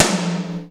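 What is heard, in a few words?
POWER KITS, tom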